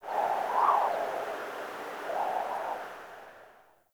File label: Prairie Day Wind Gust Soft